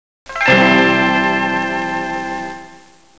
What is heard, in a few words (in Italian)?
musica strumentale